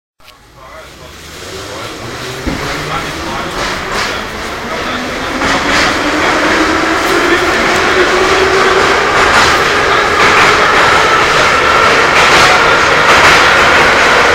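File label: sound noise of metro cars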